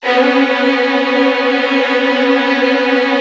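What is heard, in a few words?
Virtuoso Strings, violin trills, B1; ILIO; Kirk Hunter Virtuoso Strings VOL-1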